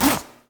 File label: Zipper